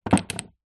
food spice jars glass x2 small set down on wood shelf together; zapsplat